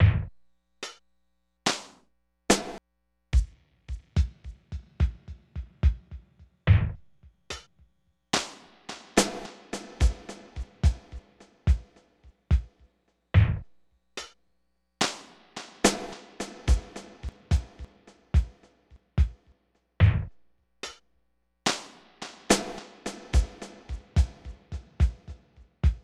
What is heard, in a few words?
echo beat 72